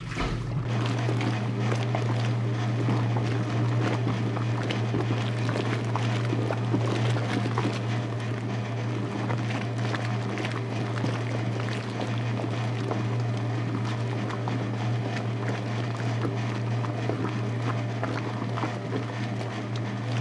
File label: Wash Machine Washing